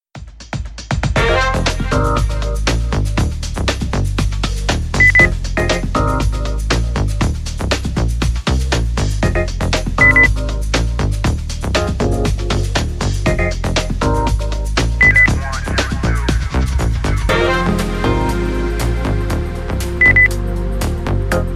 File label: lounge deep house